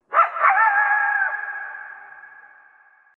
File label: coyote bark howl